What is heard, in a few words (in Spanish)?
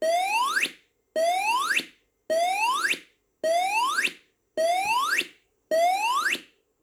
tono; S SIRLAMP